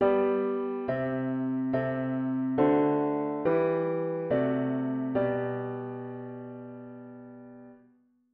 diat inv V7 inv bass

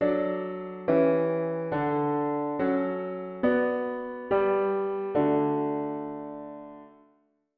harm dict diat inv bass

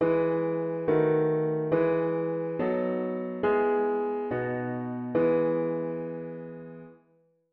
Harm Dict diat inv V7 inv bass

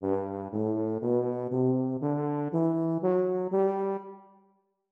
major G2

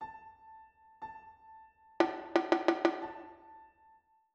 rhythm compound 2 beat

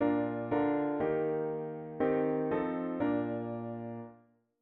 chord prog chunks diat inv and V7

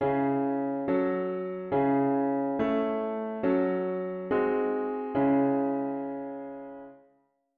root V7 bass

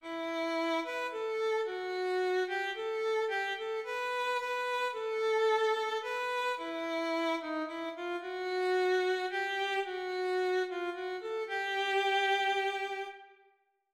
Mel dict chromatic long

